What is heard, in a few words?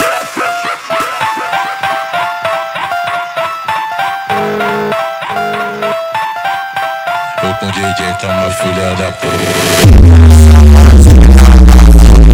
Hardstyle Remix superbass reverb slow